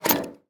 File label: Semi Truck Tanker; Blastwave